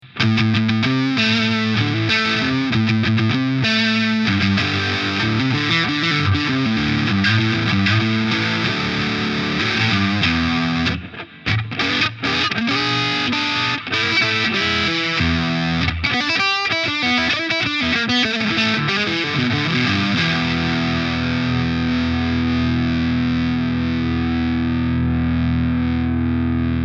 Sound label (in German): Solar Guitars GC1.6 Hals parallel; Killertone